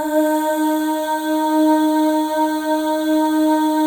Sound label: Voices, FEM AHS; Spectrasonics; FEM.2 AHS